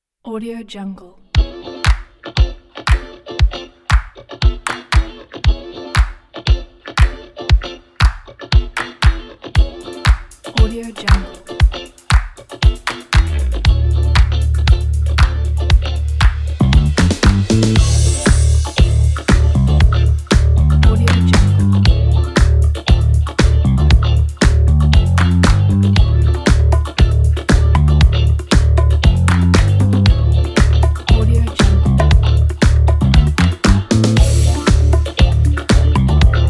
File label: energetic disco funk